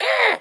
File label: Imp Damage